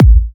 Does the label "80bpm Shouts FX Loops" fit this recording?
No